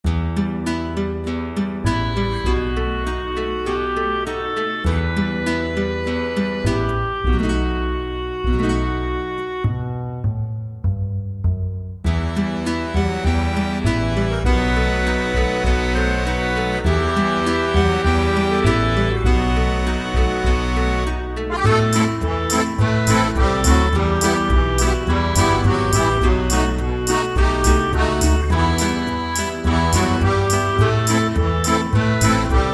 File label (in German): instrumental